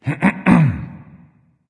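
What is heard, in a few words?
nonverbal clear throat; smite; voicepack; ullr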